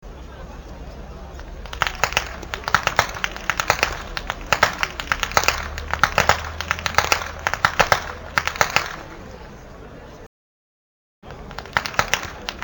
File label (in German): schnalzen, jugend; rossdorf